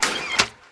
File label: Trash Chute; Food Truck Game